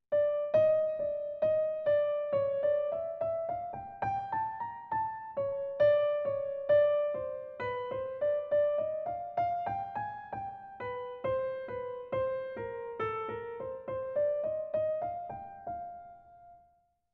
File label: motives; 02 - G Minor 3/4